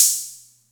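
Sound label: Atlantex Music Percussion Computer hi-hat closed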